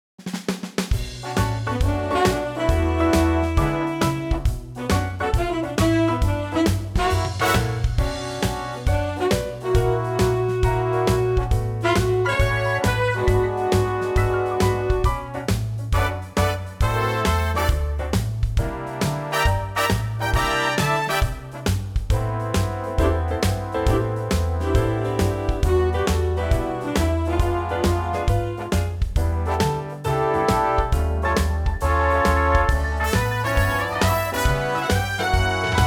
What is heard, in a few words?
Bm Big Band